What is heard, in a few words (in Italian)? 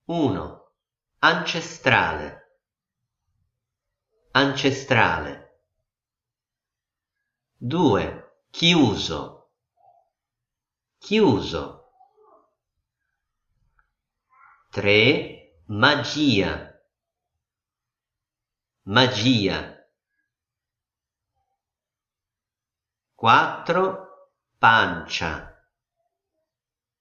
dettato C e G